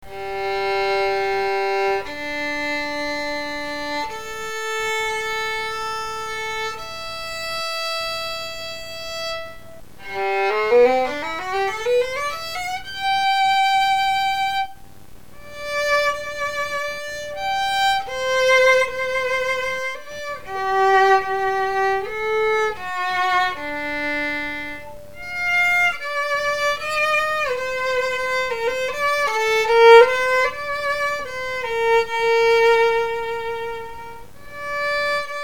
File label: German Strad, violins